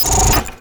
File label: Main Menu Back; ogxbox; XDK4627 BIOS and XDK Launcher and Dashboard(for Retail)